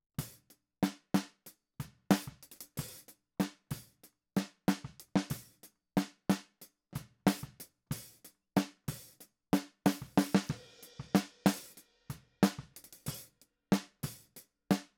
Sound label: Snare